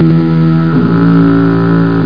rasp

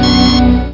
communicator; arnie

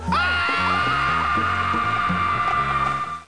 Disco - Program Error; discotheme

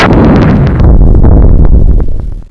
lightning; Glypha III

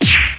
Kick

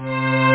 double up string; OctaMED SoundStudio V1; strings

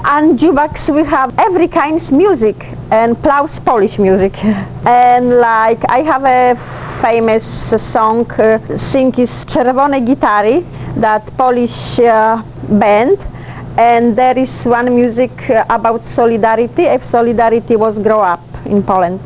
juxbox; Blender Magazine volume1.3 1994

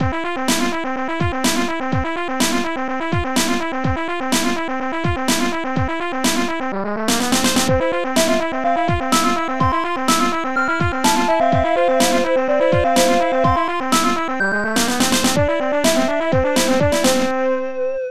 jazz

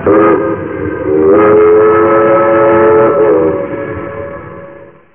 Toot Toot (long)

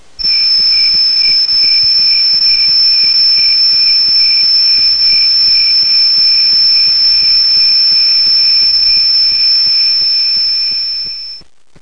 crickets

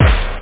star snare

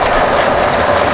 ski; NewSurfs

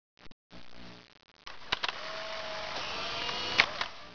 polaroid

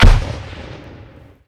grenade detonation; SpecOps2 MPlayer Demo

